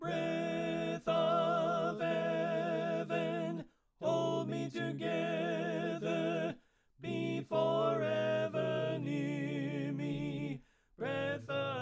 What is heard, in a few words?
Alto Left